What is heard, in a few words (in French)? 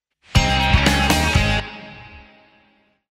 virgule poker